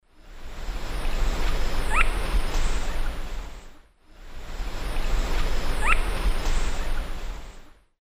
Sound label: Hoploxypterus cayanus, call; S. America; Eastern Lowlands